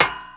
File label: METAL